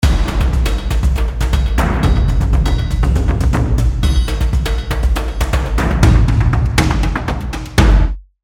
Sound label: Full Loops; Loop Designer